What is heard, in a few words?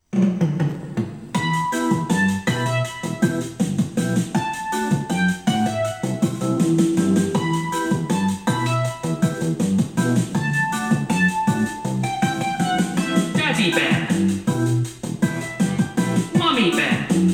BEAR RAP